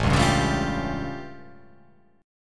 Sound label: A Augmented 7th A